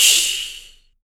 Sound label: CYMBAL, MALE PERC; Spectrasonics Vocal Planet; Jazz FX